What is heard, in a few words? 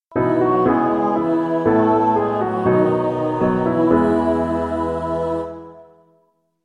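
kyrie empty broken; kyrie eleison ii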